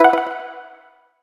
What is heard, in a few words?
click short confirm; saiyku [rby] instafade